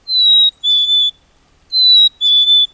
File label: chickadee song